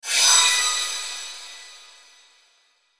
Whoosh Metallic Ring Airy; Whoosh Metallic Ring Reverb Airy; Blastwave; Whoosh Sound Effects

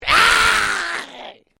Created Creature Small Cry, Alien Sound Effects; Created creatures, vocalisations and pretend speech

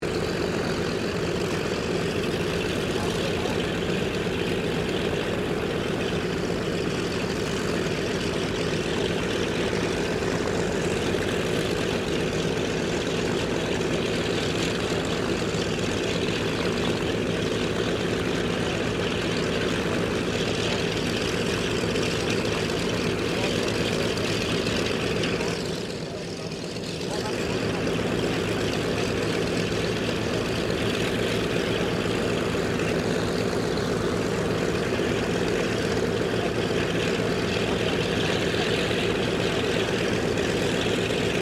AIRCRAFT AIRFIELD PROPELLAR AIRCRAFT STARTUP TAXI; Airplanes; Vehicles

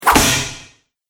Bastard Sword Hits Armor; combat

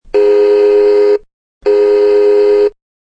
Two toy train hoots.; Toy Trains; Toys

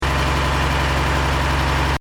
Engine: Medium Revs Loop, car; Diesel; Cars; Vehicles; Stuart Duffield

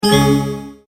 multimedia tone error; Tones; Sound Design; McKinney Sound

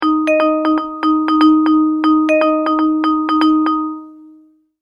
Multimedia Tone-Thinking/Processing; McKinney Sound

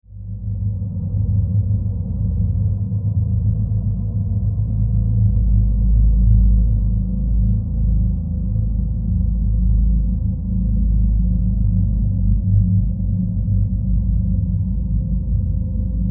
Horror Drone Demonic Low Rumble; Evil Sound Effects; Evil (Sound Effects:Horror)

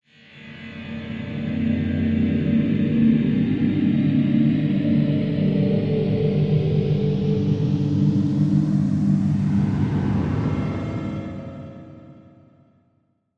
Ascend Sweeper Dark Musical; Blastwave

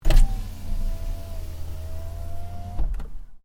Hyundai Accent Window Open; Hyundai hire car; Accent 20 08; Cars; Vehicles

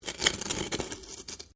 Stone On Stone Small Scrape

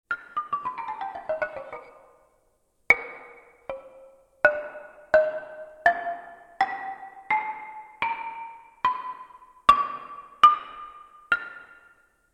Exzilaphone moves various, Wooden Various Notes; Miscellaneous Musical; Tom Hutchings

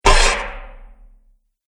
Hand Axe Slashes Armor; Impact Sound Effects; combat sound effects

